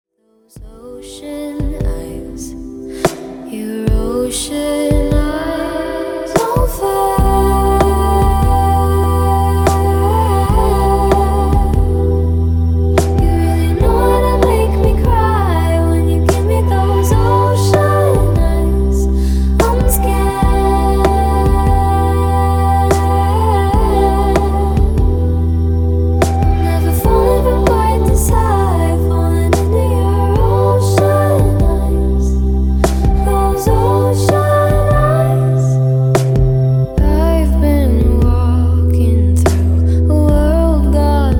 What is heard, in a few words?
slow grooves